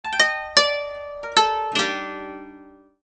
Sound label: Koto